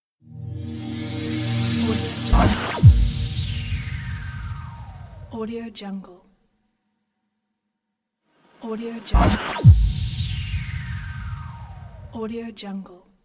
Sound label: black logo; alivestone